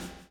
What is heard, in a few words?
BLOW; Spectrasonics - Backbeat